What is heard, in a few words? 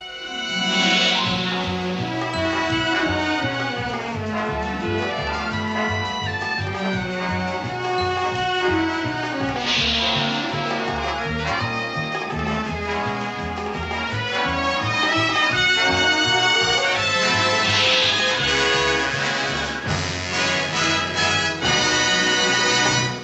ringtone